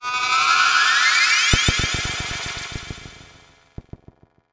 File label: sound design shrill acsend delayed mono